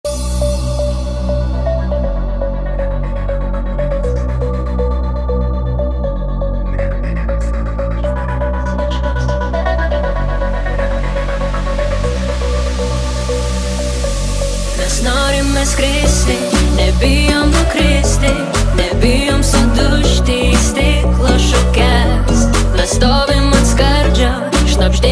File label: clubmix